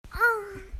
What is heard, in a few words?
auhhhhh